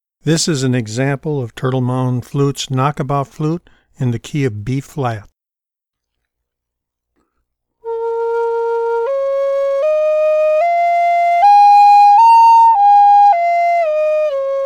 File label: Knock About Bb, flutes